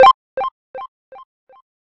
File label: PUZZLE Success Beep Three Note Fast Climb Delay; stereo; Universal Sound FX